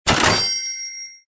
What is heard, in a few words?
gold move; Brazil; crash